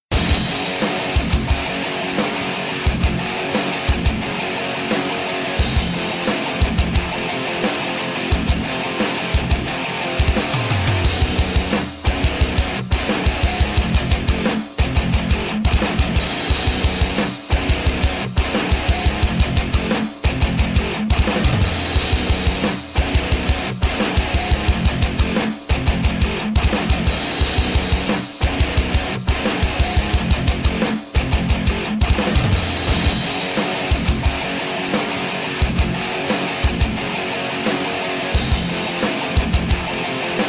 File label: Unused Dog Round Music; Call of Duty Zombies